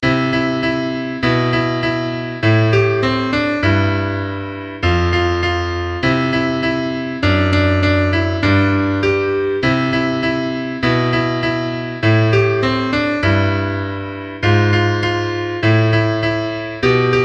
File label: jingle bells